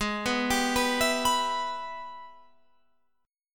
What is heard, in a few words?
Ab Minor Major 7th Sharp 5th chord, Guitar, Open F tuning; arpeggiated; 20,18,19,18,19,18; down